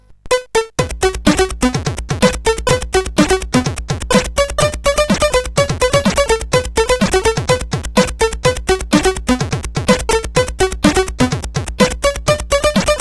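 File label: MOS 8580R5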